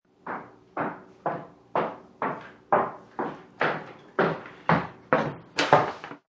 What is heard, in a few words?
Woman walks in high heels on wooden floor; Footstep Sound Effects; Sound Effects:Human